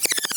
Spider Box

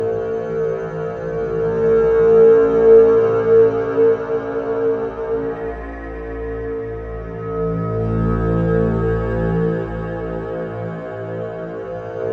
Gameplay Ui; Prince of Persia The Lost Crown

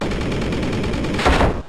Horn Drill; Pokemon Reborn E19

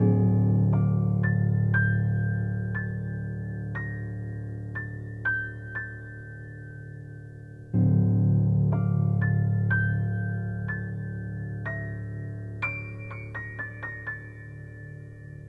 BGM Tension; the letter a horror visual novel switch gamerip 2021

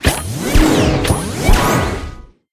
Striped Wrapped Combo; Candy Crush Soda

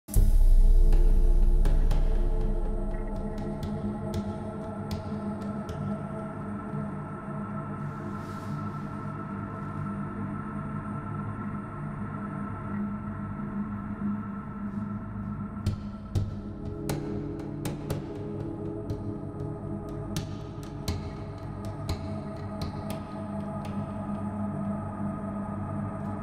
Monster's Lair Ambience

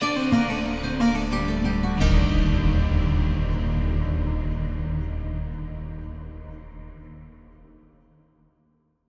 Stingers sting stairs

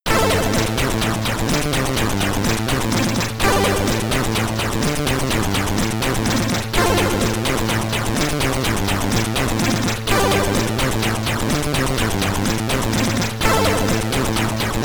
Main BGM, SID Stereo

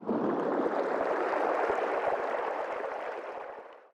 waterways tank fill

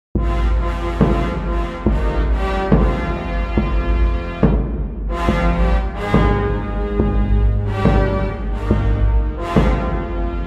announcement music fast; Gladius VR; Rome2

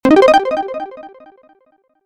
Level Up; assault on metaltron ps4 switch windows xbox one gamerip 2019

